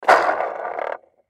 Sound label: Cart Wheel Spin Hard; Foley; Spinning; Objects; Blastwave